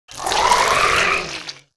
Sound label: Swamp Leech Play